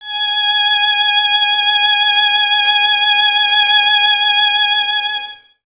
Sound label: violin, Solo Instruments